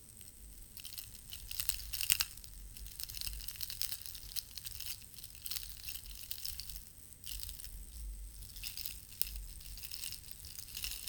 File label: a large piece of jelly